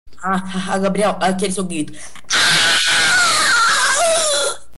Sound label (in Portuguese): grito